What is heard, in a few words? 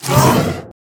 Spider Grunt General Scream Start Combined